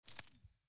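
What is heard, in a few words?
environment building sold coin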